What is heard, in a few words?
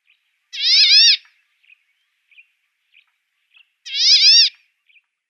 Cinnamon-breasted Bunting song